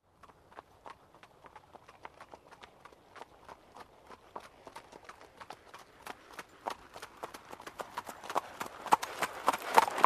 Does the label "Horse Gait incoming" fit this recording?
Yes